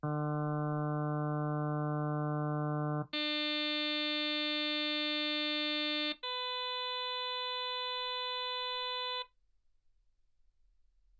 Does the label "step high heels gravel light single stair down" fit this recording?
No